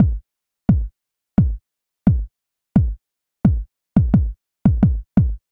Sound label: Kick, 87 bpm; hydrogen